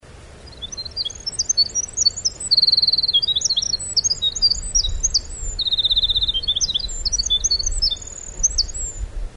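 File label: Winter Wren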